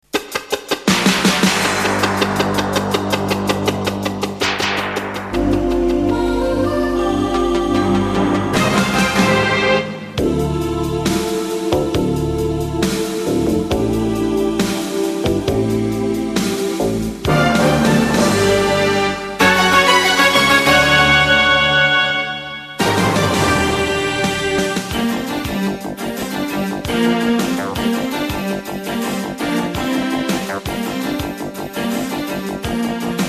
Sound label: crown jingles; lbc